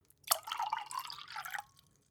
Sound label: glass pour soft; soundpool